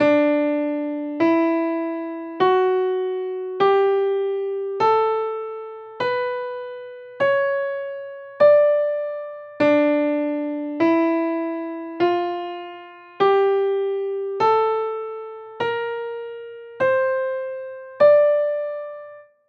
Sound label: scale d major d minor parallel; escalas